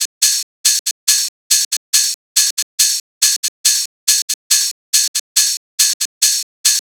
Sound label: 140 House Open Hi Hat; Dance DJ; Drums; Hats; Pro Tools Essential Loops; Digidesign